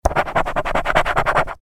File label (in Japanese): スクラッチを削る音; board game casino mahjong